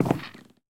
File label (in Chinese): 嘎枝之心踩踏声